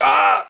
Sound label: pain